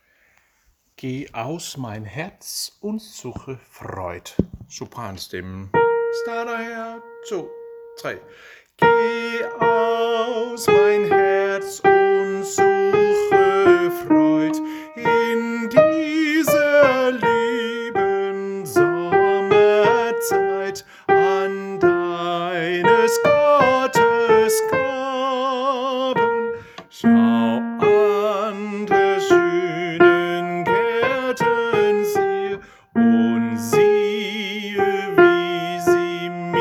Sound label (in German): sopranstemmen; harlev koret